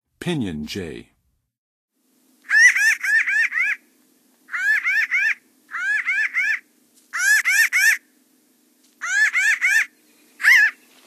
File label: Pinyon Jay, Songs of Birds; North America; Western USA; Western USA Songbirds